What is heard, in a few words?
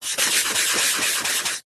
foley abrasive foam rub clean or sand concrete circular motion